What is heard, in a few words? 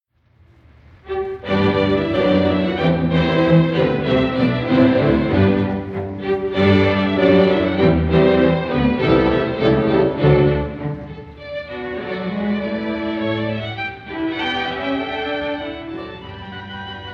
Orchestra; UofI; Stravinsky